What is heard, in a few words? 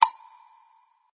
down; CrazyBrick